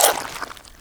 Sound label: Death Noise; Angry Goy II; SoundEffects